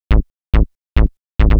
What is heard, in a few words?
SNTH BASS TEKNO 140 A, Techno, Bass